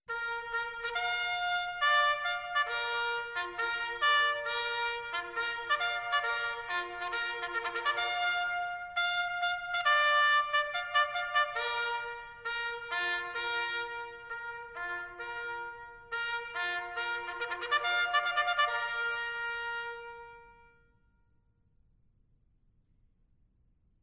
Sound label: Retreat Solo